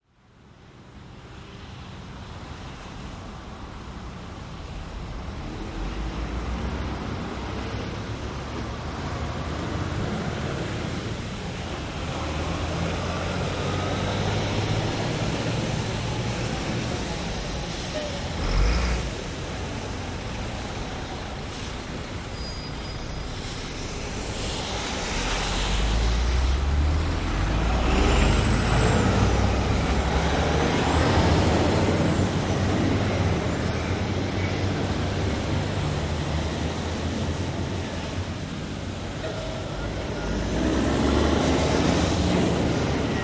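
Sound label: crossroad trucks rain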